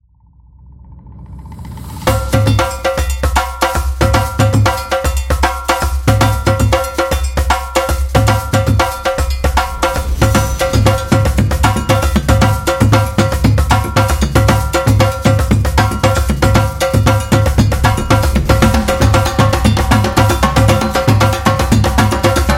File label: Africa Percussion